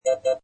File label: Pinball Sound Tone; Video Games